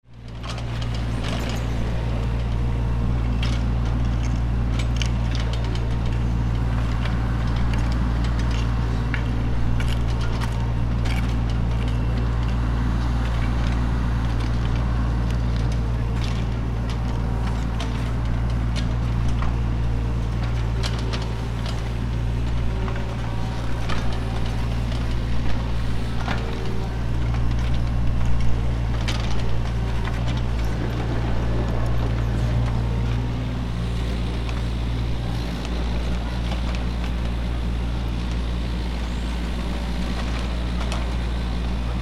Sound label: gym